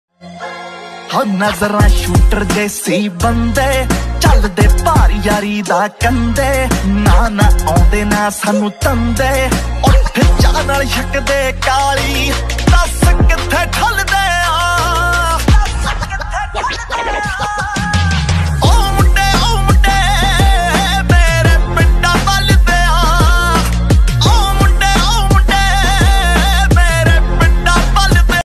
Punjabi Ringtone

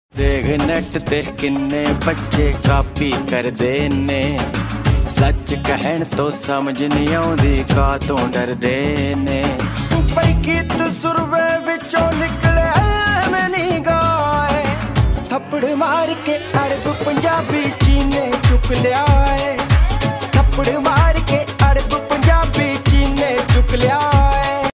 Popular Punjabi Ringtone

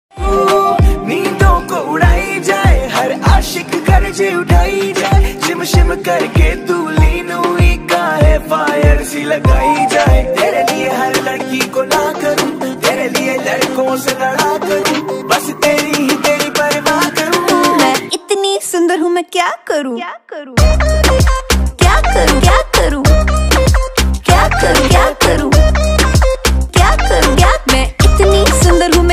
Punjabi Ringtone

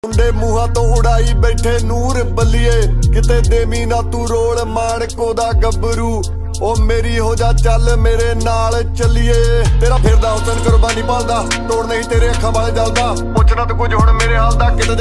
Dance Punjabi Ringtone

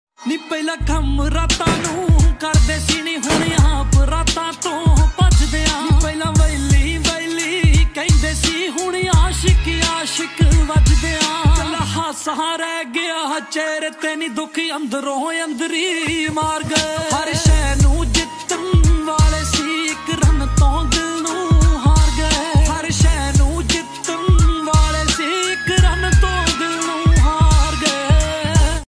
Punjabi Tune Ringtone

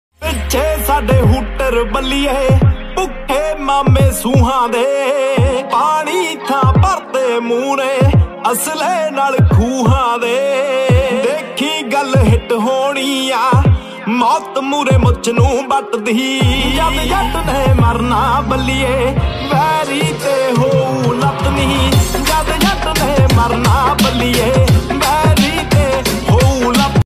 Desi Punjabi Ringtone

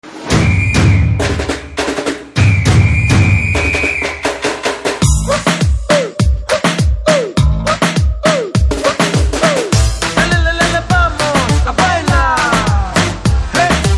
BG Tone Telugu Ringtone

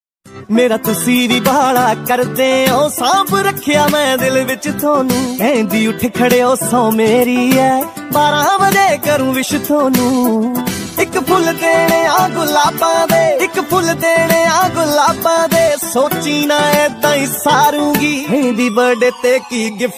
Punjabi Beats Ringtone

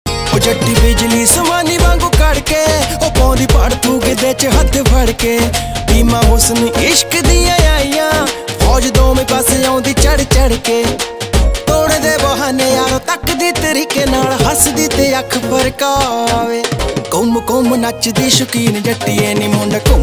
Punjabi Ringtone

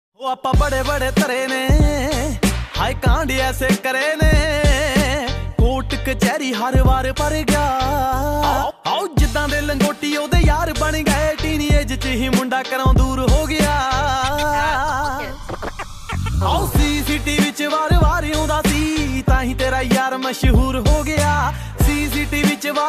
Punjabi Beats Ringtone

